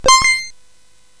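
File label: sfx, MOS 8580; hvsc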